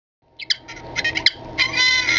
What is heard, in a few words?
r2d2 sound; swsaga